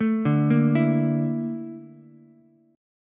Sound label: A sharp Major Sharp 11th, Ukulele, C6; arpeggiated; JGuitar